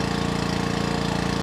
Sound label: Aircon; Megacity